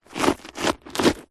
footstep single boot scuff on dirt track grit stones; foley; zapsplat